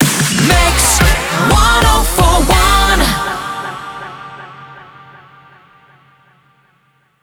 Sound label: 120bpm g Rapid Fire